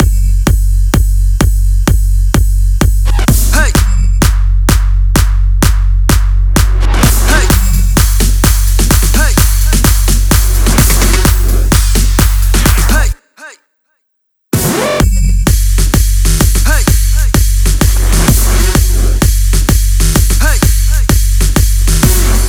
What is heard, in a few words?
Drum Loop 128BPM Full